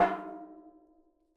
Strike, Washing Machine Drum Rim

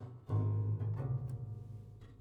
Prepared Piano

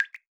Drip; Drips

